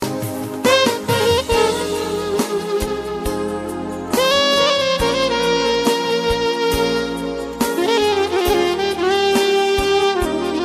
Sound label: sad music ringtone